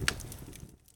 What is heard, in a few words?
Fire Pop; Hollow Knight